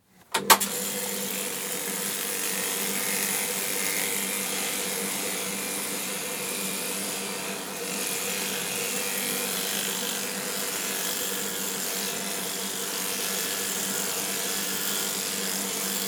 Sheep, Sheep, one sheep being sheared with some noise from sheep (Close perspective recording, length of track equals time taken to shear one sheep); BBC